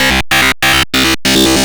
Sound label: GRUNGE